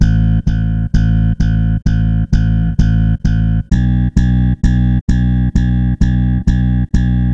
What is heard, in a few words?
bass